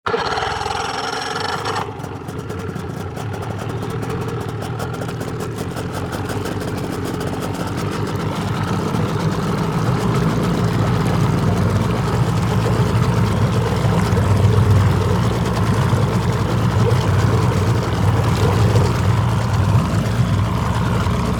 Vehicle Dive Raft Start Up Rev Engine Steady Shut Off Boat